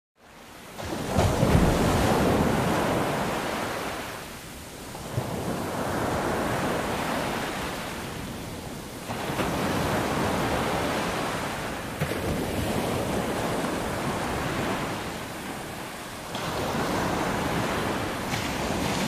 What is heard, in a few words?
Waves On Beach Close